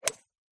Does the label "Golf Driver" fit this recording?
Yes